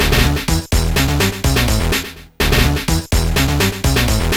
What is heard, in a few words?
beatbox; Pinball Dreams